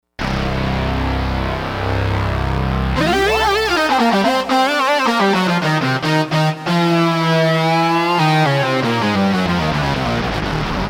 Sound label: Lead